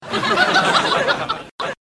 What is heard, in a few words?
soft chuckle